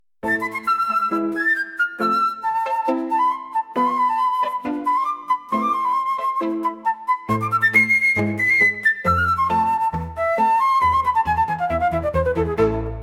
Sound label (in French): musique jeu